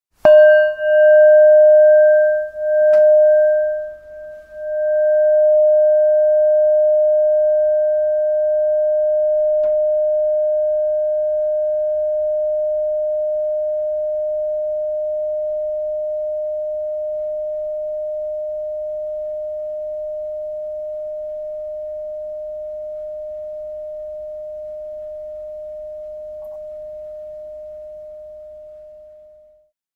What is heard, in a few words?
Spin gong Mid